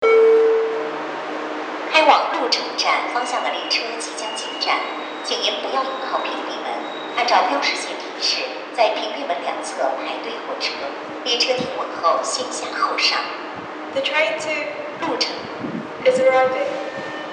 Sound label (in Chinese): 6号线 潞城站方向 进站